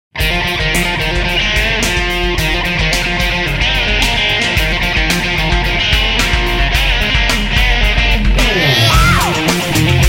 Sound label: Distorted Drive